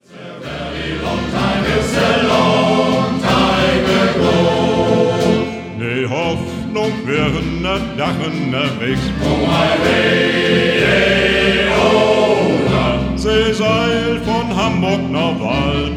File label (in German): Shanty Chor